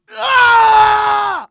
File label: mega pain; hl2dm